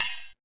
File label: HH OP; HH OPEN; AKAI; USB Soundscan vol.20 - Fresh Disco House I